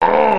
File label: Defeat sound